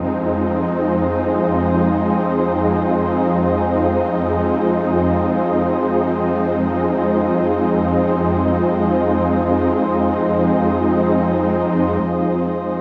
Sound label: pad dark F